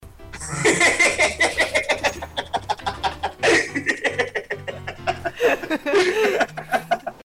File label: hehehe